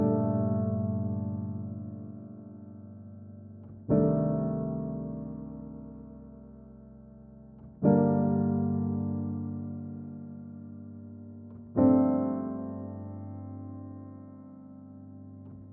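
122 BPM Piano Chord Progressions Cinematic Drama A major G minor Tonic G; MMB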